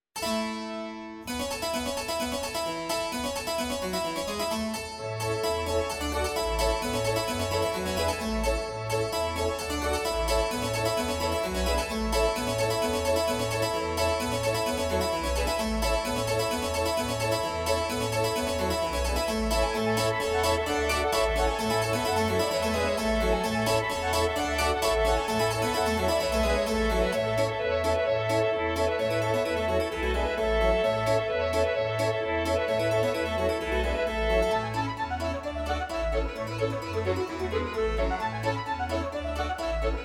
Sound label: Grand Player Organ